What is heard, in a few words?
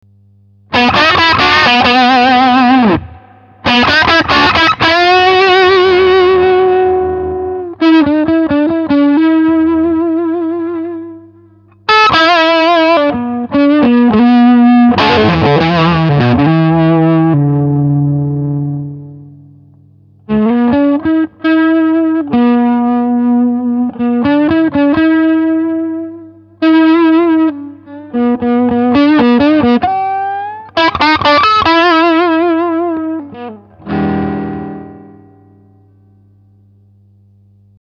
noise gate; tc electronic pedales classic